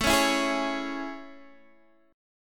A Major 7th A, Guitar, Standard; AM7 strummed; x,12,11,9,9,9; JGuitar

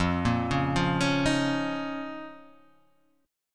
F 7th Suspended 4th Sharp 5th F; arpeggiated; chord; F7sus4#5; x,x,3,3,1,0,3,0; JGuitar

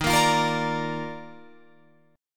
Eb Major 7th Suspended 2nd Eb Guitar Open F; strummed; EbM7sus2

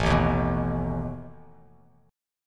A Suspended 2nd Flat 5th A down Bass Standard; Asus2b5 strummed

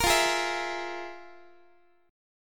F Minor Major 7th Double Flat 5th, Guitar; FmM7bb5; strummed; x,x,13,15,12,15

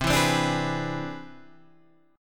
C Augmented Major 9th, Guitar, Half Step Down

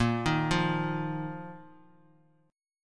A sharp Suspended 4th Bass Standard; arpeggiated; A#sus4; JGuitar

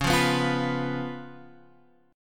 Db 7th Db down Guitar Open F; Db7 strummed

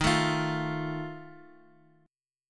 D sharp Suspended 2nd Flat 5th D sharp Guitar; strummed; JGuitar

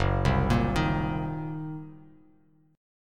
Gb 6th Flat 5th, Bass Standard; arpeggiated; Gb6b5; 2,3,8,8; JGuitar

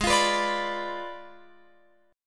G sharp Diminished 7th G sharp, Guitar Open F; G#dim7 strummed; x,15,17,18,17,18

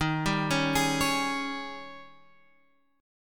D sharp 11th D sharp; D#11 Chord {11 10 11 X 9 9} arpeggiated

